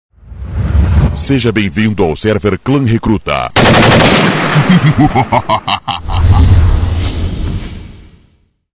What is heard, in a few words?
loading